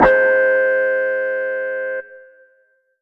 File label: Yamaha CS2x Guitar Harmonic C5